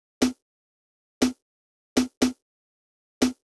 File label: Snare; VariBreaks; hydrogen